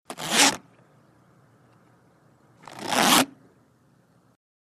Luggage Zip Unzip, zippers

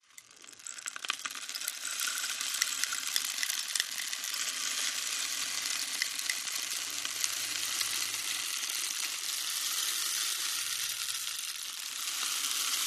Rain Stick Slow, rain stick

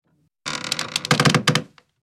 Heavy Wood Creaks And Stress, X4

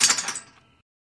Gate Close; Glen Oaks

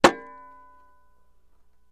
Axe Hits Shield

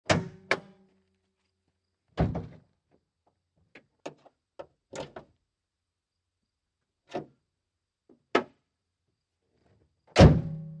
Montero Hood, 1998 mitsubishi montero ext.

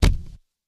Camera flash, flash pot, deeper, less explosive; cameras

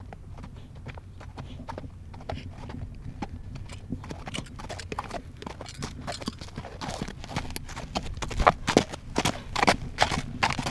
Horse By To Stop

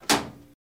Lighting Board Leve, levers

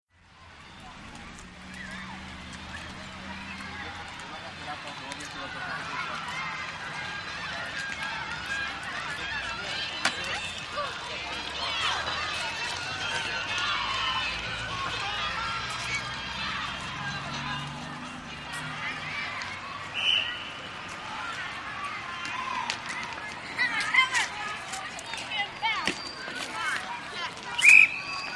School Playgrnd 84th, cheer

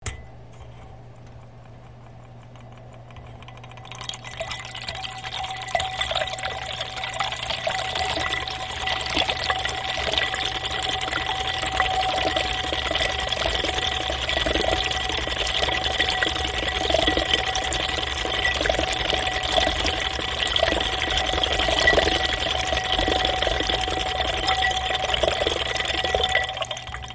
Machine Stirrers, variable speed; Lab Machine Turned On / Off, Liquid Stirred In Large Glass By Whirling Metal Spoons. Recorded with Left Channel Air Mic & Right Channel Contact Mic

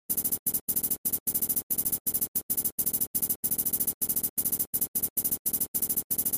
Alarm Comp Digital; alarms

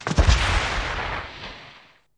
Dragon Falls, dinosaurs

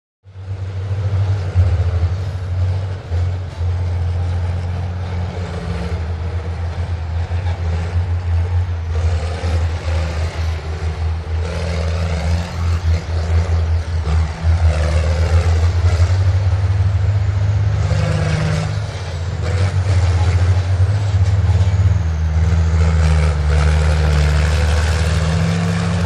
Quarry Atmos Many, earthmover

